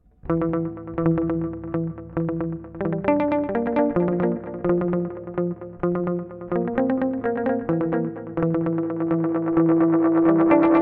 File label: volante tape